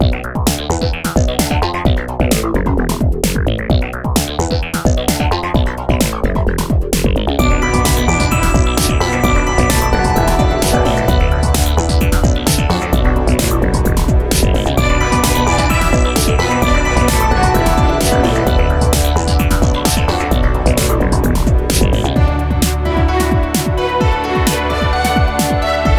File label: Boss Music